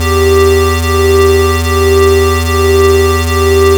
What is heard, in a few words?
YM Chord G; ChipShop Deluxe; ChipShop Hits